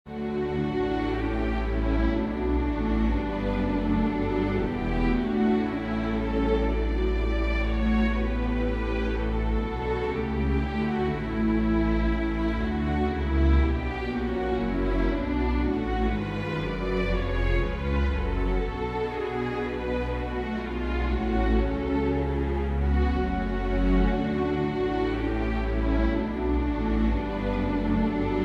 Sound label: sonata minor for string ensemble; mendelssohn bartholdy felix